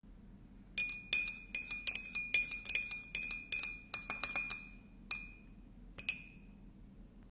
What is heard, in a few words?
kitchen